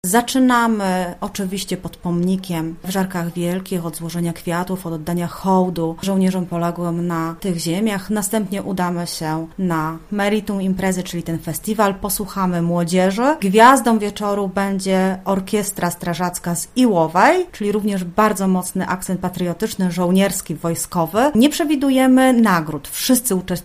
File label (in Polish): Wojskowe pieśni; W Żarkach Wielkich, w gminie Trzebiel odbędzie się dzisiaj Festiwal Piosenki Żołnierskiej i Wojskowej; Radio Zachód